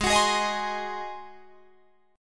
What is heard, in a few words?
G sharp 7th G sharp, Guitar Drop D